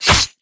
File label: knife hit; Valorant